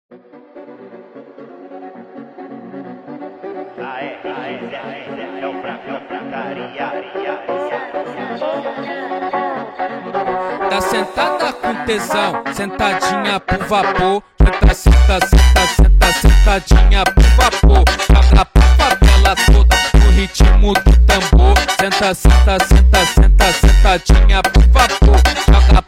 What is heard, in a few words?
FUNK